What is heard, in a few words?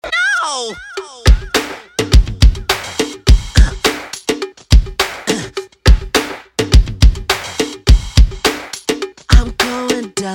Funk Electro